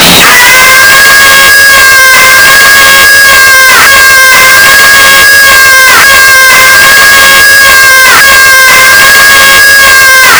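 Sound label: scream extreme; ff2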